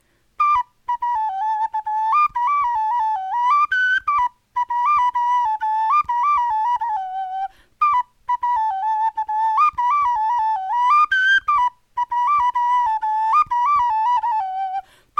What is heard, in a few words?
melodie, knochenfloeten